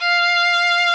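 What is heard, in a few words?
viola and violin, string quartet; 90s Sample CDs; Club-50 - Foundations Roland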